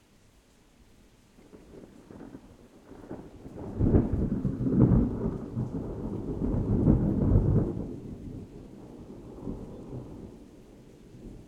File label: RAIN Distant Thunder and Rain Long Thunderstorm, Suburban; Bolt; Backyard Rain & Thunder - Suburban Rain Recordings